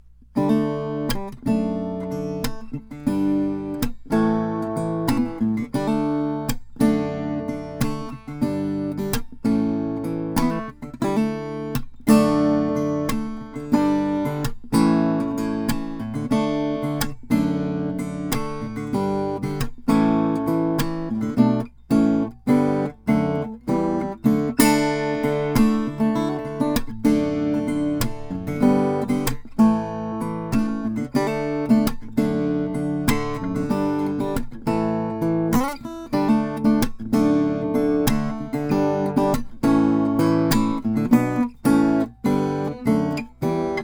lowden equals limited figured walnut; sheeran by lowden

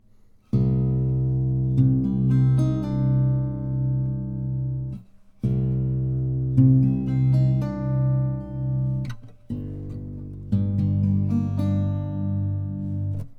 sedgwick 20 string harp guitar

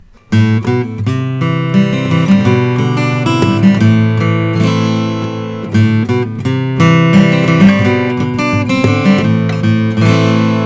goodall grand concert; rgc 6707

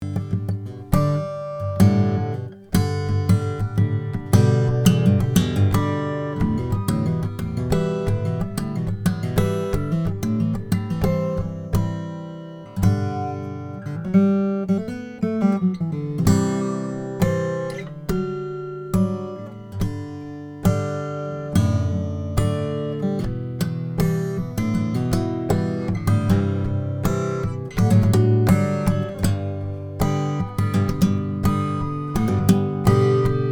olson sj spruce top; olson sj 1997 indian rosewood

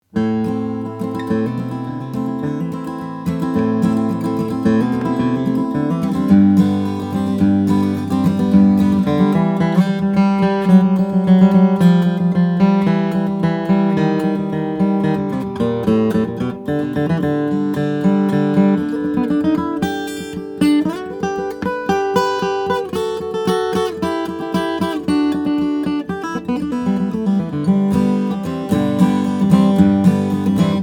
reclaimed wood, guitars; ld 18; 1945; lucas

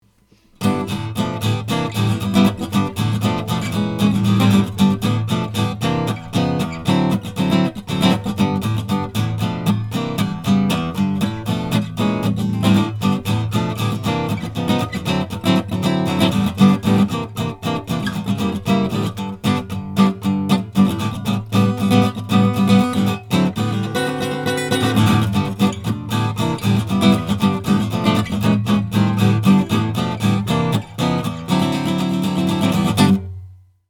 gibson l 4 sunburst

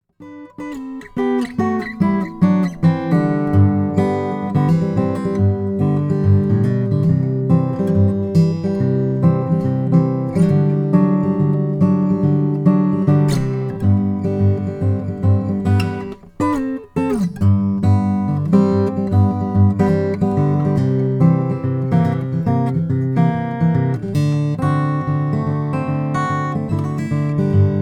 froggy bottom h12 limited brazilian

